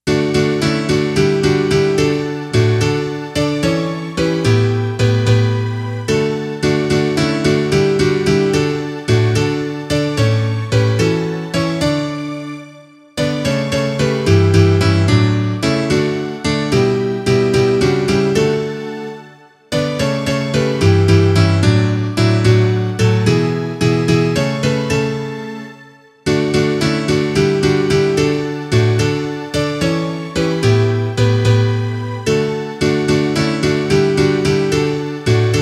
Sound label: instrumental